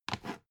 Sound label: dvd case put down